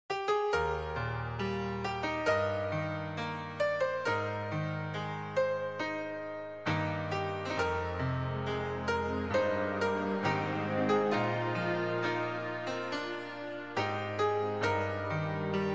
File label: Orchestral